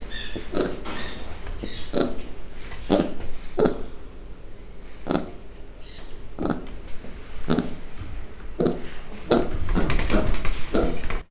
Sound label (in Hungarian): fehérhomlokú maki; miskolci zoo 2009